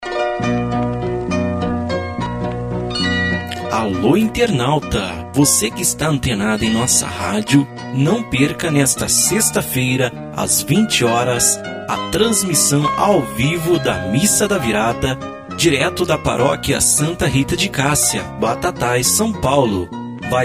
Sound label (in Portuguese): vinheta; missa da virada